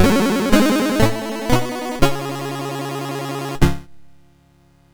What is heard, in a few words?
sid MOS6581R4; Escape from the Planet of the Robot Monsters